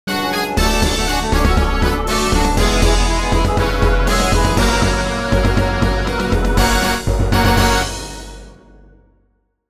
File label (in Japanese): お燐ジングル